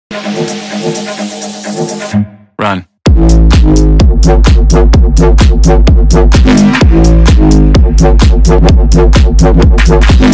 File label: Mobile Ringtone